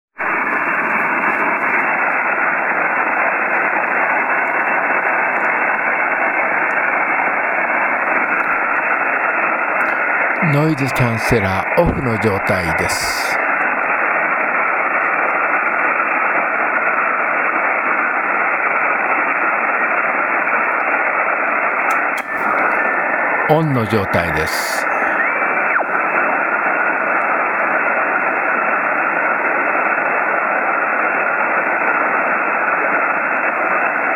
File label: 7MHz; MFJ1026